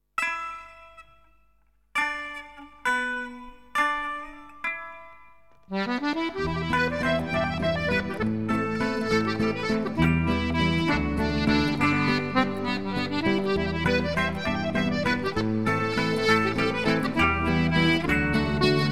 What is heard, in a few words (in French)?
Accordéons Métissés Valse Chinoise